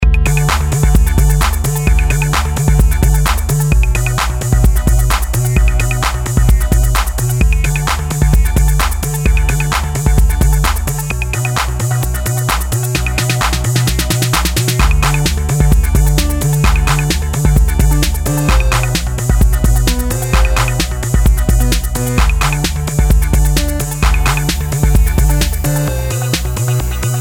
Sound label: electro; Native-Instruments Massive